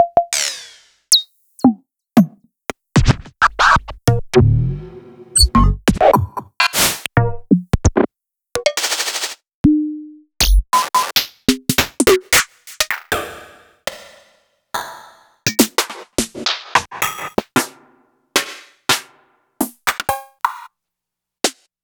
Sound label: Elektron MachineDrum SPS1 MKII